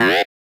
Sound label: Toaster